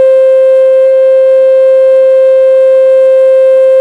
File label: carrier; balloons and static electricity